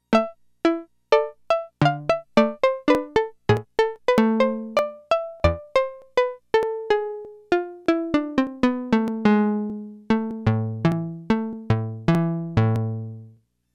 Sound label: Harp II